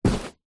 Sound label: foley footstep single shoe softly slight tip toe carpet walk